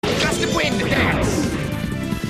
Gust of wind; dance

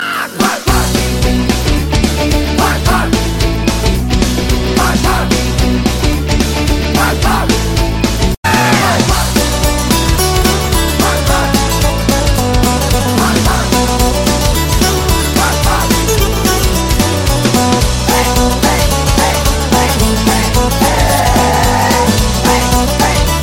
Guitar

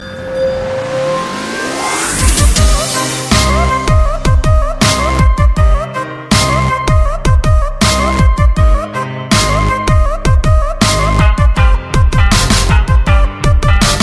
Hip-hop

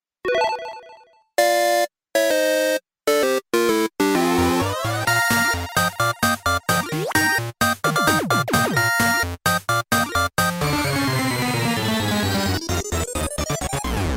game Effect

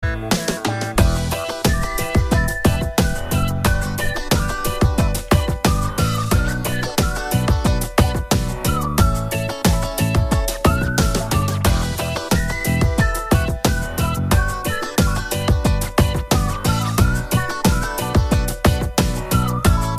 triangle dance

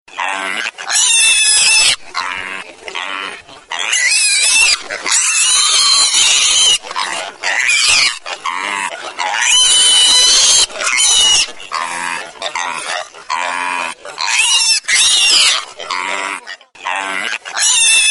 Pigs

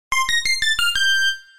Mms Alert

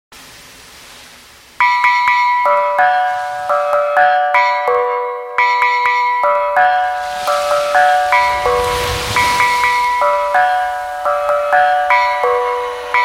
Club Bels

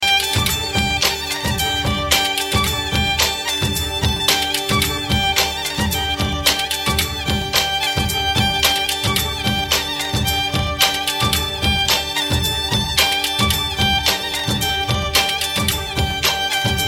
Sweet Love Romantic

